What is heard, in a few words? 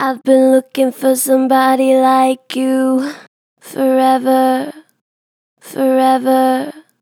Gen Vib Ive been looking for somebody, Vocals, Natural Vibrato Gentle; Harmor Vocals